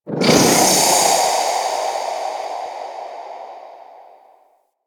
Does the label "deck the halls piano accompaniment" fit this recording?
No